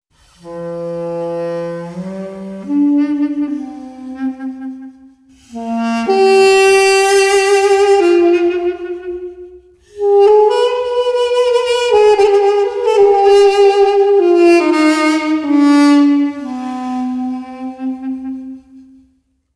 Duduk Phrase

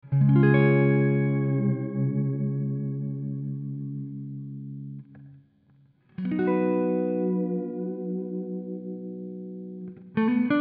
Guitar Reynolds Basic

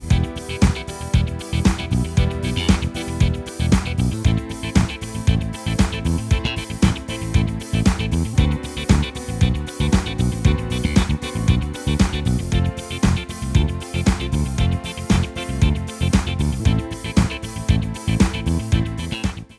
Yamaha Genos Style Jam

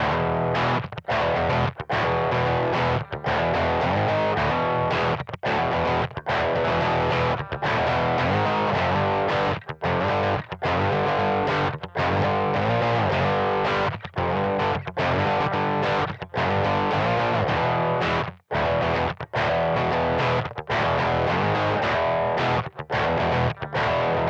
Simplifier DLX Parallel AC Brit EL34 412er

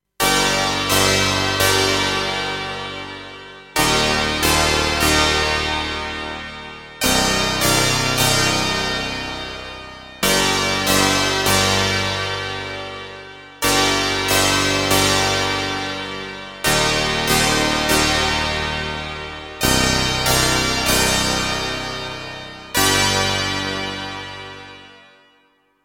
80s Chords

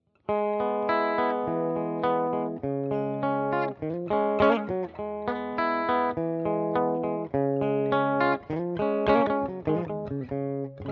Chords Fender; JAG-STANG

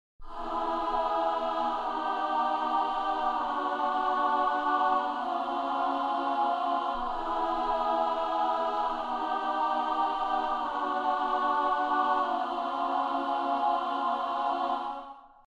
Choir Steinhof Kirche Vienna original; VSL MIRPro3D; EW Elves

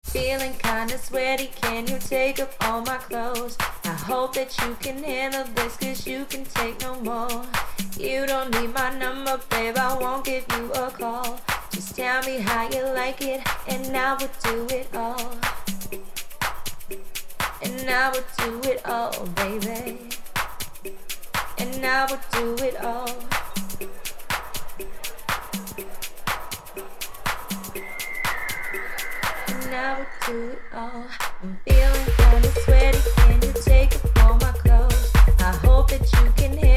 Ortofon Concorde MKII Scratch

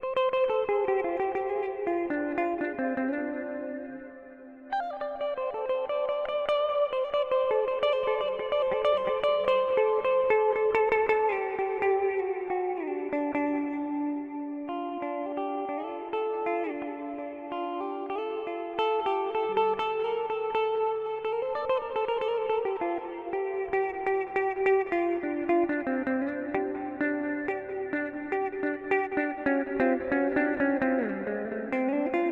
TC Electronic Hall of Fame 2 x4 Plate

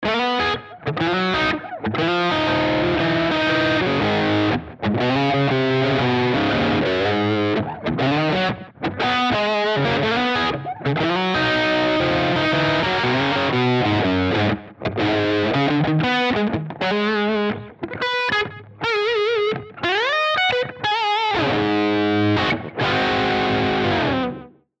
BOSS GT1000V3 ORANGE STCK SPECHO

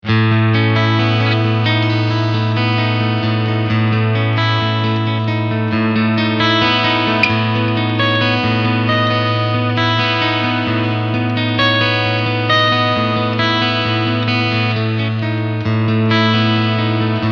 Piezo

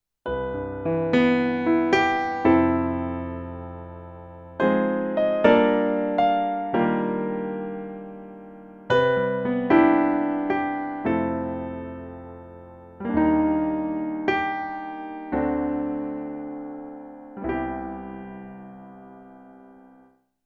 Roland Go Keys Stage Grand Piano

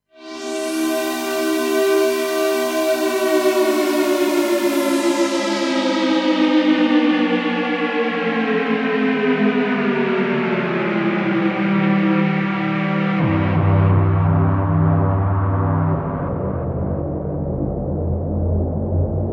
FX Bellz

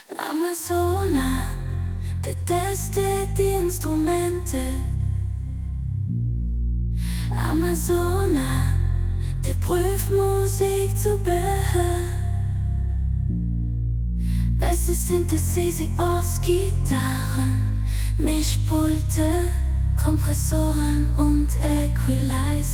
Dance

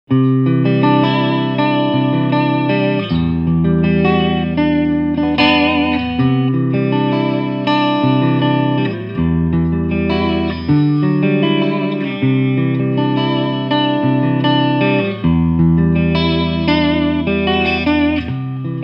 Clean Picking

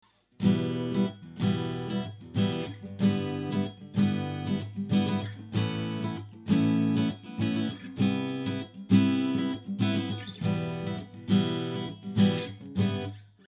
chords

